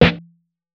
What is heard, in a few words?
Snares, Alesis Performance Pad